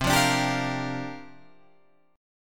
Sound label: C 9th Suspended 4th, Guitar, Open F; C9sus4; strummed; 12,12,14,14,13,12